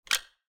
revolver prepare; ranged weapons guns; psfx

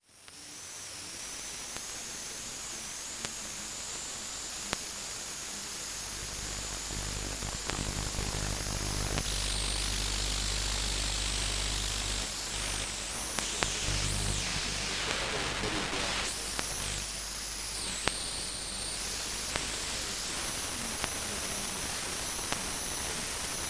radio tower static interference, electromagnetic; electromagnetic design vol 2

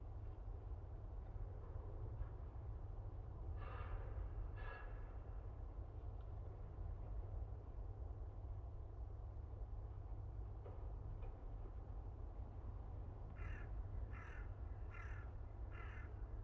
empty train yard with city background; sonniss gdc

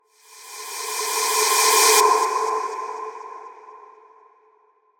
eerie passby; fvtt sonniss gdc audio

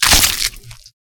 zombie massacre head smash; corpses

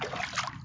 water swim

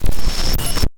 ui glitch heavy glitches glitch grains; roguewaves

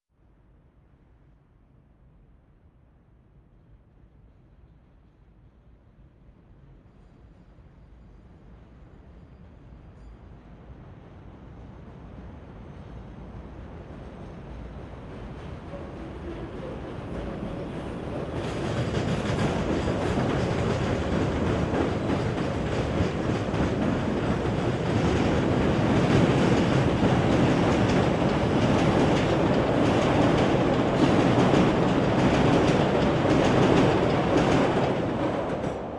l train by moderate speed under tracks; Sonniss GDC

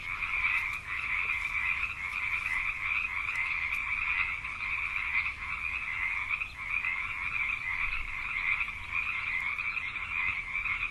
frogs; baileywiki maps premium towns

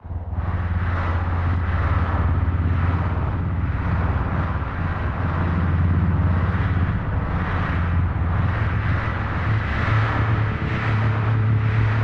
galactic wind; sonniss gdc

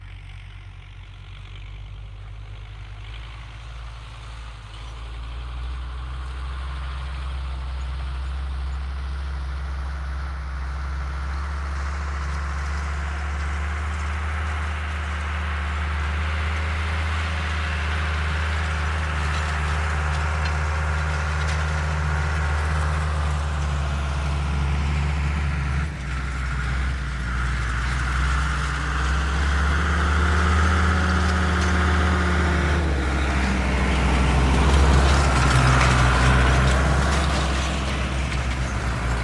tracktor on the fields small distance; sonniss gdc

